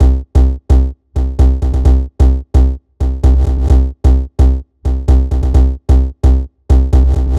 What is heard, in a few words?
Beat Loop; DF KIT